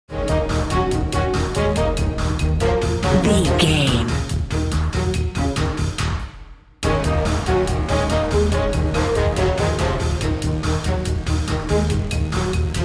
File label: pumped big game bumper